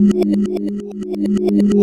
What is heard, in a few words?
Marimba FX; Sonic Foundry (Sony Creative Software); World Pop; Percussion